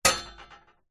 impacts metal hollow frame hit sharp strike rattle